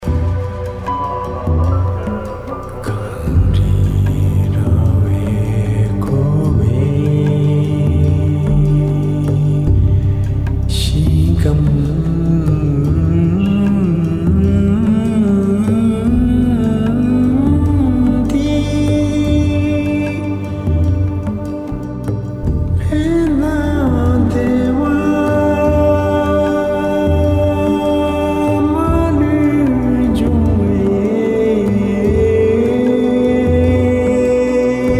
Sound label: long life chant